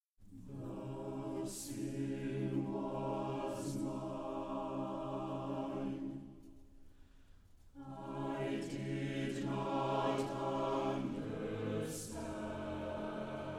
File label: Chorales